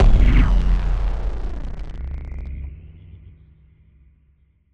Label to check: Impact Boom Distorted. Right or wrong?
Right